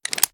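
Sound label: pistol reload fast raise; gmod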